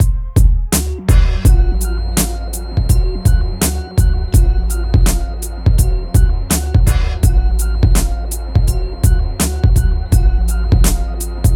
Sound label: MIX, Hip Hop and R&B Drumloops